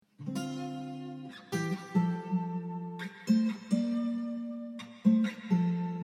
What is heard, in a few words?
pop acoustic mix